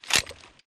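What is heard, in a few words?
reload magazine insert; re45; Titanfall 2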